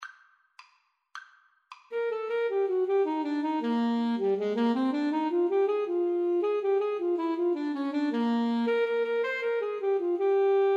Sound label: mexican hat dance Alto Tenor Sax Duet